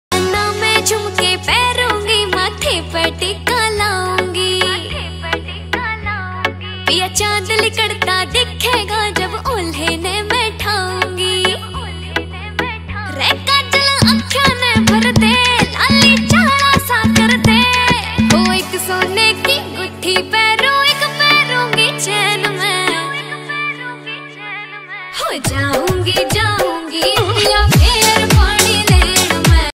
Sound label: haryanvi ringtone